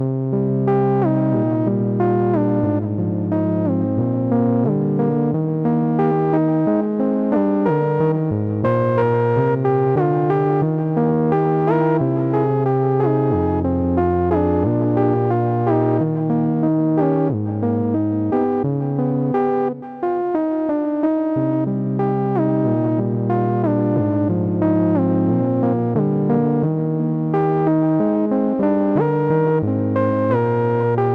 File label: poly portamento example, an example (a single track) of how this can sound with three-voice polyphony; synth; Jackdaw